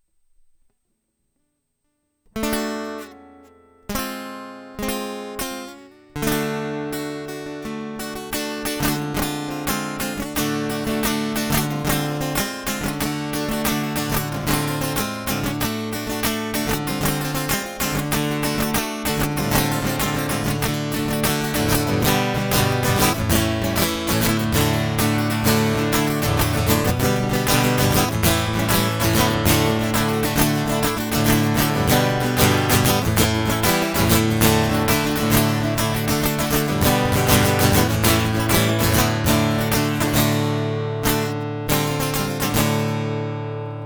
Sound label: Guitar Loop